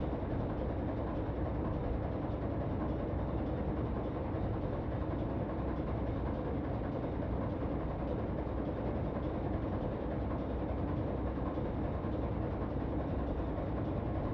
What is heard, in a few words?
machine, ambient; nuke